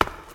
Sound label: cave grounds step; Golden Crayon Sounds